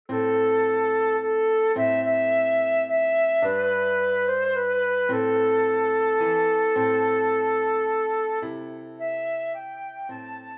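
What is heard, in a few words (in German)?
Querflöte; Scarborough Fair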